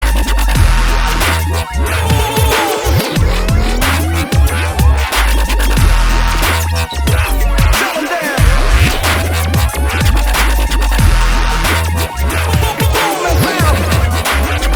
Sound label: Popping Beats, percussive pops